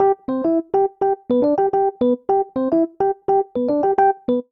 Ostinato Montuno I - V C Major 106 bpm, Electric Keyboards; MIDI Clips; Ableton; Factory Packs